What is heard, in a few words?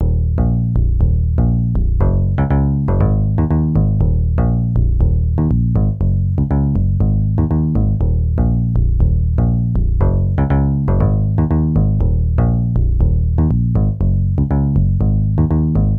Bass Yamaha TX7